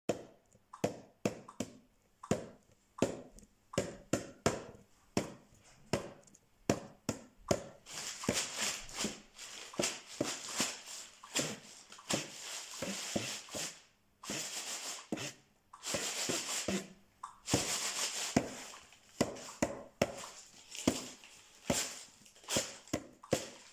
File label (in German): Papiermusik